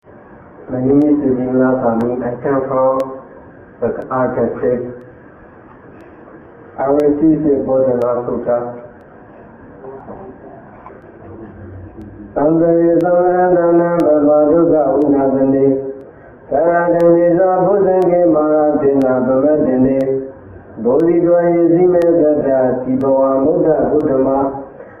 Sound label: chanting